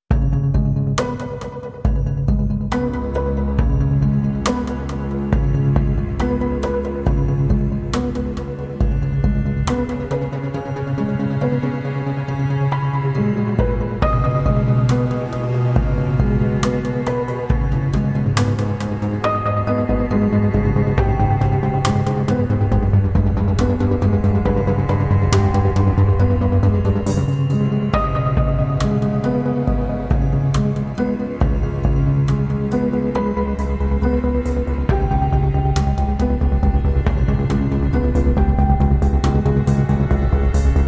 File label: Cello